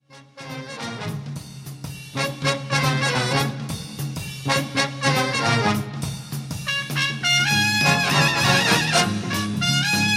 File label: swingtime